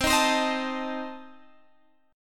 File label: C Minor 6th, C, Guitar, Open G; down; chord shape